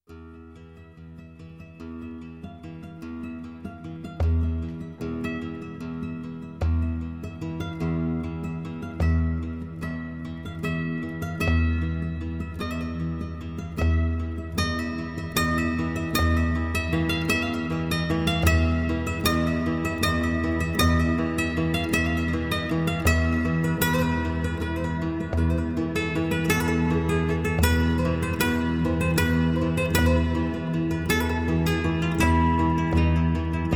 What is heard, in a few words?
Flamenco, spanish guitar